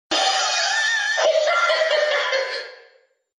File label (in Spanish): Risa; Guason